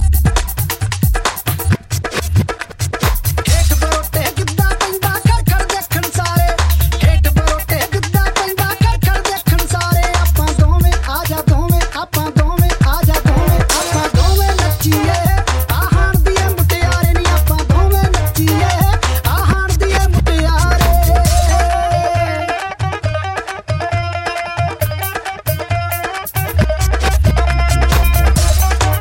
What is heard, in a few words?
Rocking